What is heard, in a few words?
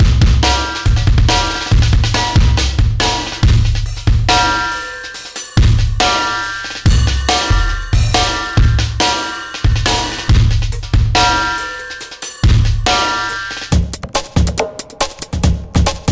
industrial beatings